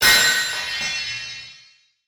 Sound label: pipegrill hit